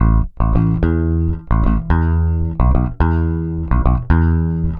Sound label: BAI BS AM, BASS BAION